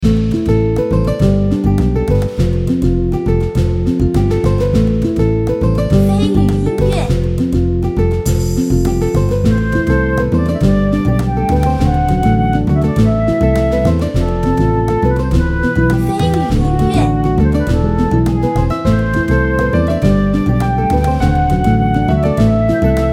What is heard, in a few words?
drift